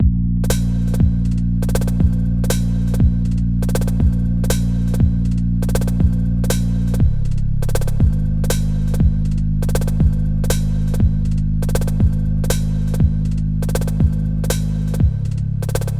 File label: midbeat bass